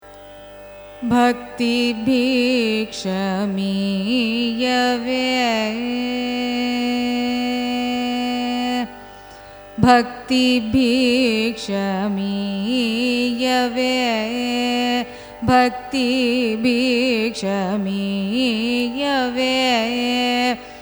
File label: raga shankarabaranam carnatic; carnatic music